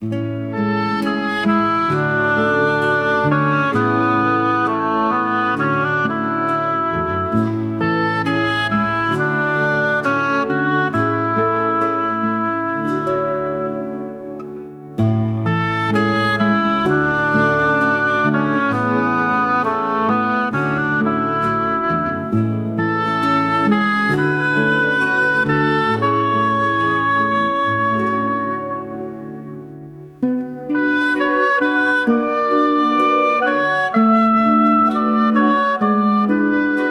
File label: hold music; Unknown hold music 2 ai recreation